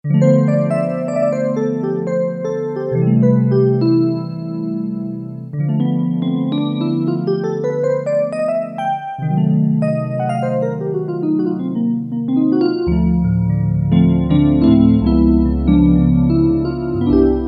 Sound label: Electric Piano; Electric Piano 1